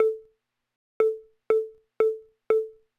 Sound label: Timer 1 sec, Camera; Motorola V14W